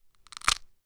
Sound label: Leg Breaking